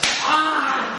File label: spank moan